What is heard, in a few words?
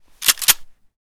Super Shorty Unload; zps; Weapons; Firearms